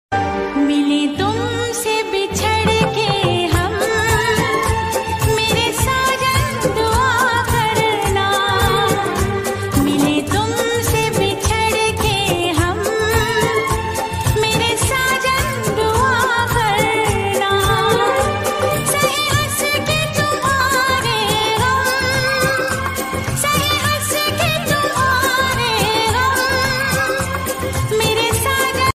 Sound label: Love Ringtone, Hindi Ringtone